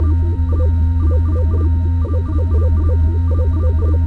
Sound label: fuelpump